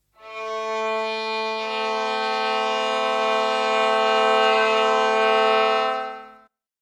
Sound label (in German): geige